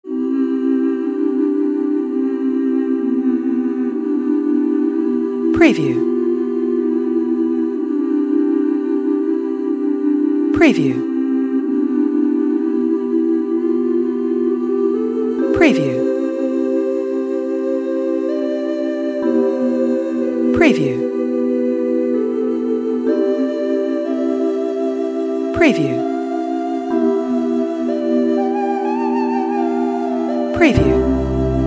Peaceful Spiritual Calming Relaxed Meditation Nature Ambient Music